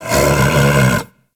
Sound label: Kubrow Tame Sleep Idle Snore F; Warframe